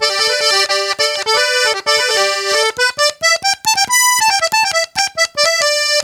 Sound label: Accordion Lik 139 G; Zero-G Kocktail Kollection; Accordion Lix